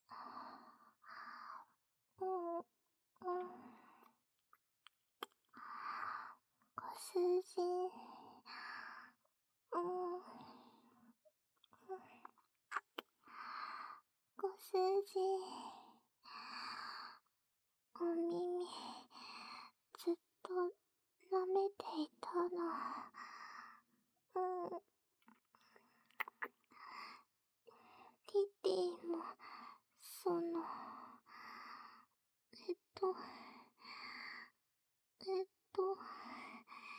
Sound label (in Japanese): 【キス】甘えたがりディープキス; 奴隷少女ティティとのあまあま共依存